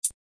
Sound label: Hat, Hip Hop Kit; drums; STYLE KITS (Hip Hop, Etc); Khezie Hip Hop Kit